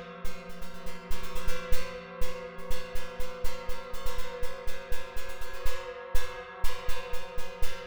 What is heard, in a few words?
Chromaphone